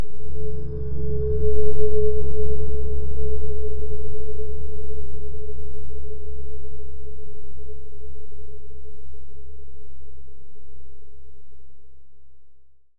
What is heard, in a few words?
System Drones - Dronetail; 3030 Deathwar Redux A Space Odyssey